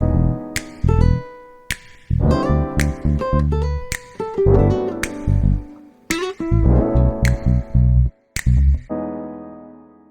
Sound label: instrumental